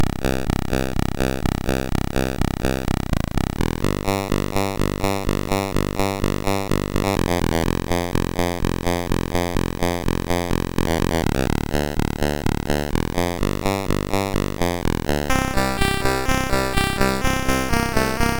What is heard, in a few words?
Turbo Modul, MOS6581R3